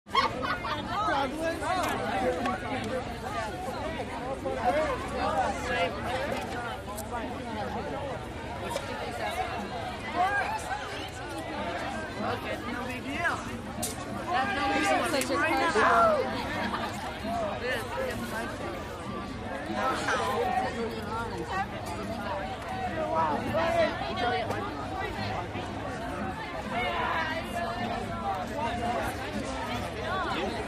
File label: High School Outside; high school exterior